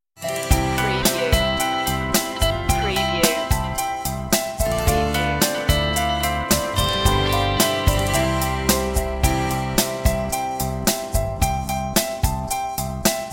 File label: underscore